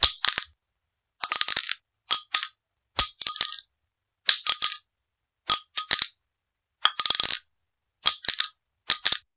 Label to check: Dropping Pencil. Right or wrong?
Right